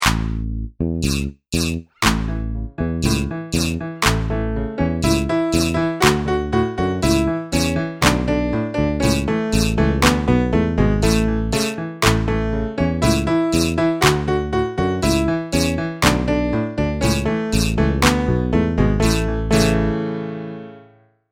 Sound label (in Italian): uomo mascherato base